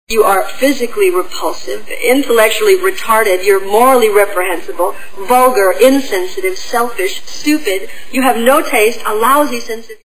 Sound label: Boy song